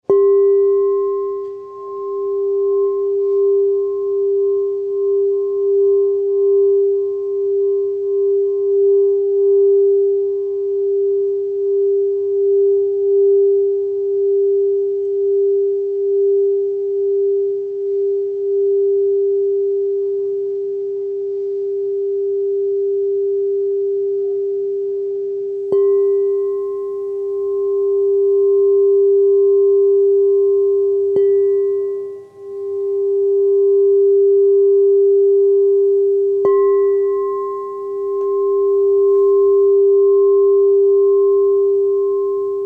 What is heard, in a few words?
TACA G4